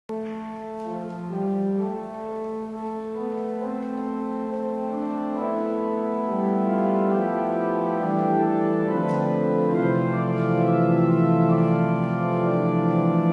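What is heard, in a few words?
Choir Play-out, organ; Louisville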